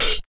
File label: damped hat sample B key